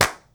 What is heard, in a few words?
CLAPS; CLAPS+SNIPS